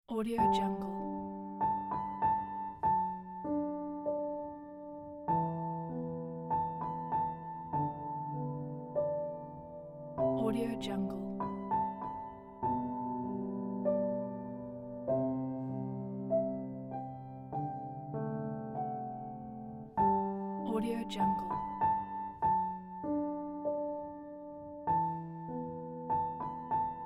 inspiring summer ambience

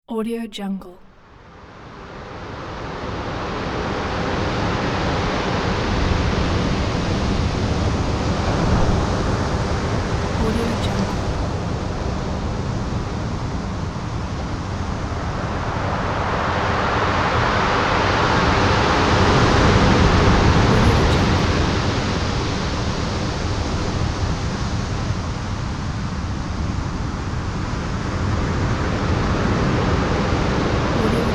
heavy surf

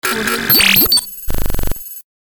computer inventory

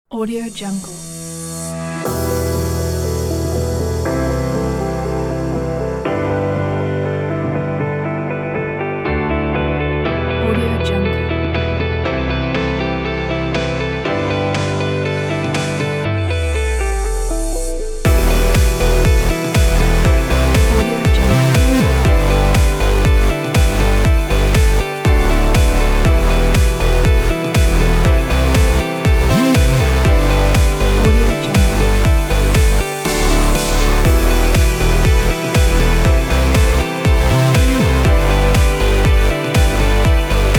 progressive electronic corporate

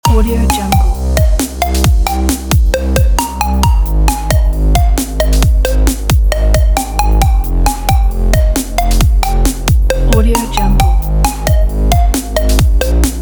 breaks loop; playtek

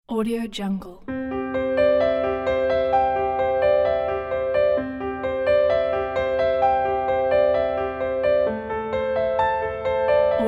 inspirational piano and strings

